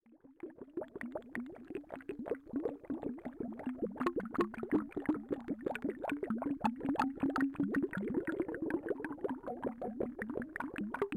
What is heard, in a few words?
Blubs